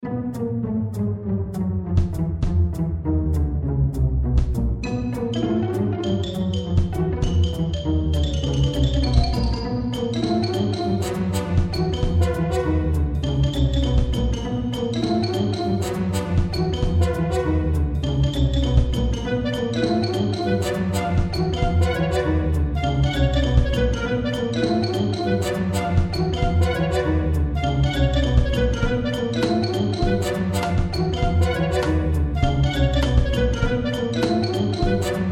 intro loop

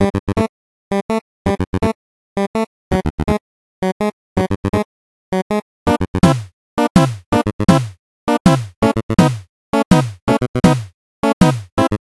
story arcade

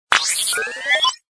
terminal Activate

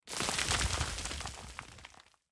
rock debris; battle; CrossCode

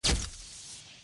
plasma

